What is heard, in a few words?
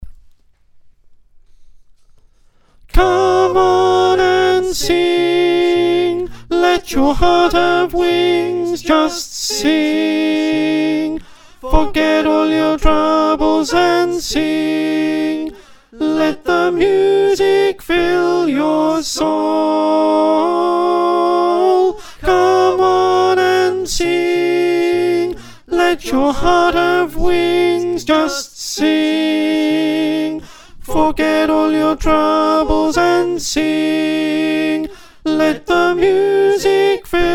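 alto, choir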